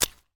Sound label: pizza walk; pizzaria